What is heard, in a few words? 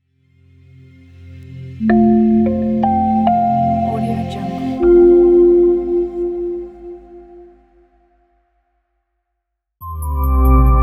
clean ambient strings logo pack